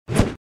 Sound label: dash